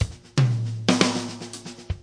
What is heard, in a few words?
gentle ghost drummer, drum loops and breaks